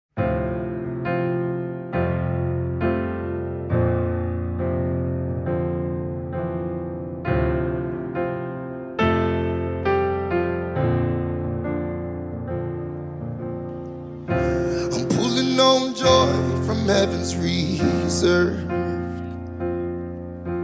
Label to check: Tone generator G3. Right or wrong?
Wrong